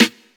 Clicky Snare Sound A#, acoustic snare sound tuned to the A# note